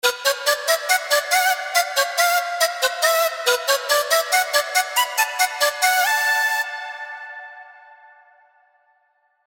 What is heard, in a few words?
Stranger Flutes; revealed halloween horror 2025 edition